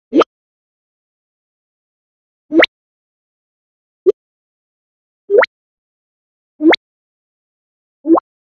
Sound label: sound effect of a blinkin, blink